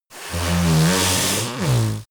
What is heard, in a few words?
yeti nose blow strong; kreaturen